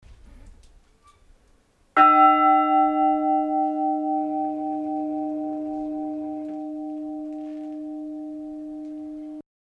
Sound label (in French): cloche; Mons